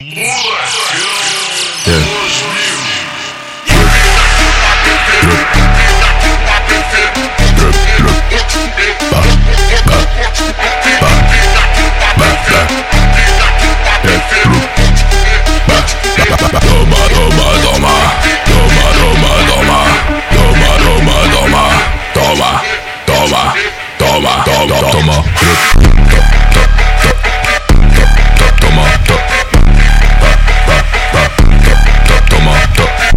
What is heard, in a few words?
Phonk Music; فانک phonk